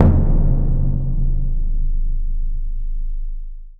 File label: BIG BD, DRUMS; Spectrasonics; Heart of Africa